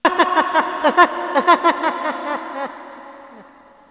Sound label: laugh